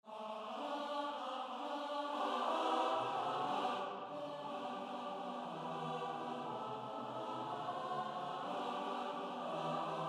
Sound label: Molly Malone SATB